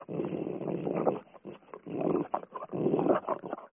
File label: Dogs Large Dog Growls